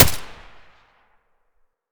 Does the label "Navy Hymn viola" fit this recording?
No